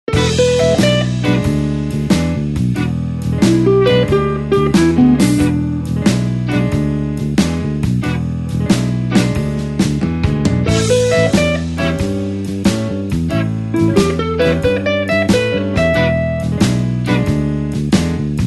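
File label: blues shuffle solo E call response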